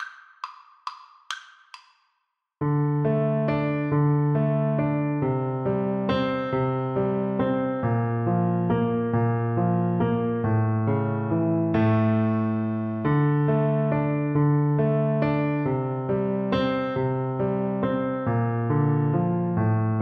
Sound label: greensleeves kar, Flute